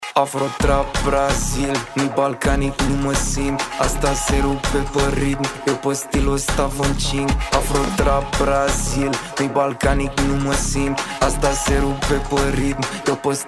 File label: AFRO TRAP